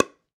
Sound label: PickelballPaddle Hit Ball Light